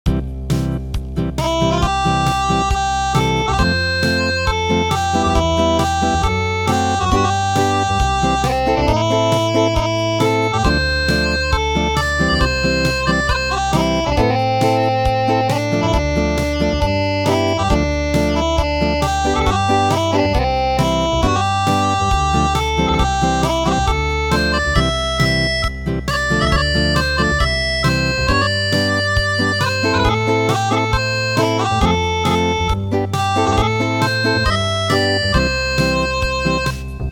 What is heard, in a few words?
Acoustic